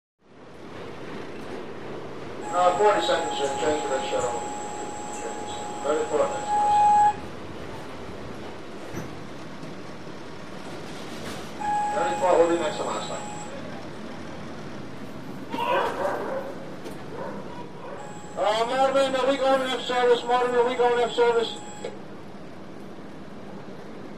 Interior Modern Subw, subway

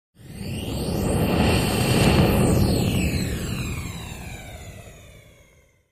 Fly By, spaceship fx

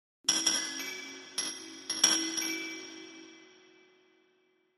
Chinese Chimes Bells

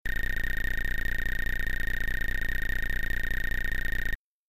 Bleeps Rapid Cyclin

